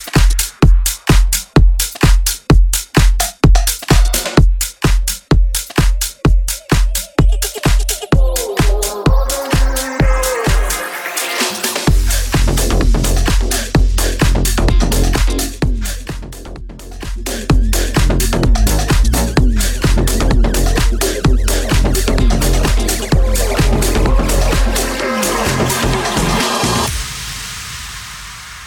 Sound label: Basshouse Bootleg Edit 128bpm